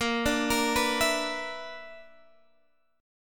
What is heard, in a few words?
B-Minor Major 7th Double Flat 5th